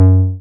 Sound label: SYN TBM G3, MC202; Sound & Vision - Gigapack I CD 2